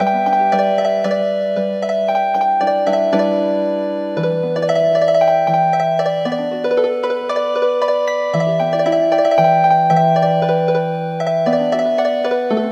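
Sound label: Healing Harp, midi traditional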